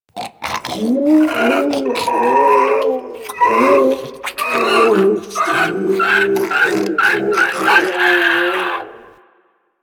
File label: Infested Jetpack Idle; Warframe